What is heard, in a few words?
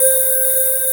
male vox C5